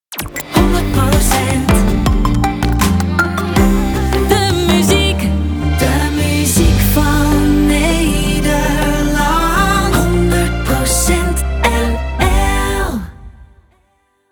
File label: Polyphonic Strings